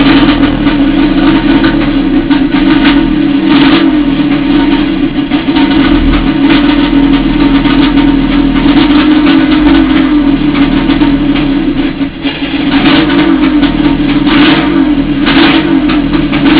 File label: 5 inch pipe